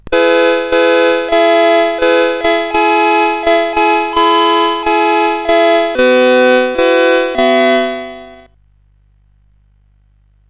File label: sid CSG8580R5, GAMES; Android 2